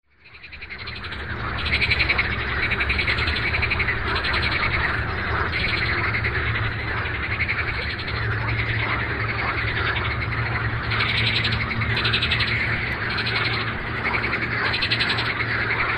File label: Pelophylax ridibundus